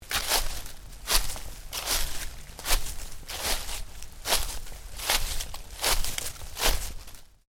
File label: Footsteps damp leaves, Shoes: sneakers, walking on damp leaves; Footstep Sound Effects; Stuart Duffield